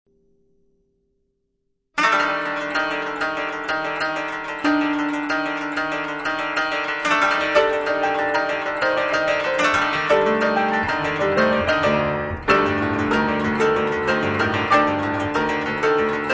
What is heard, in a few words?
Dramatic Strings